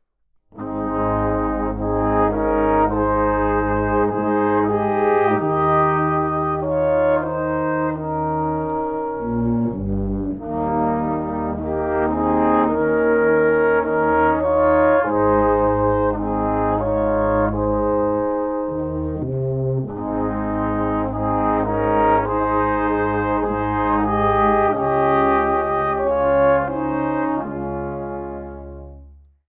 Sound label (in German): Weihnachtsblaeser